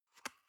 paper box small open medium

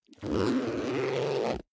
zipper backpack close

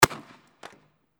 smoke bomb; disposal team

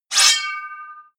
sword pull sword knife out

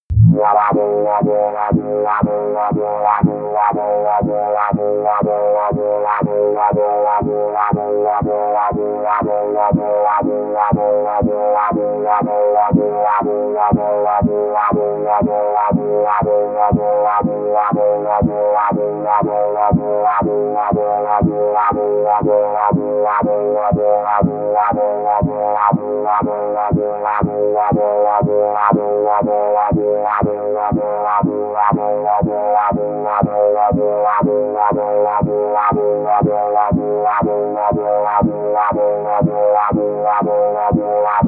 wobbling bass

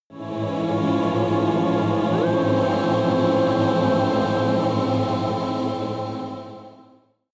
evil choir chord sequence solo

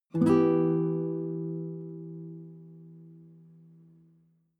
nylon guitar chord high minor soft

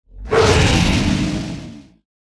monster fire whoosh

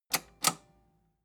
metal button robust spring click disable slow

